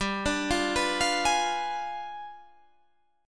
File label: G 7th Flat 9th; G7b9 arpeggiated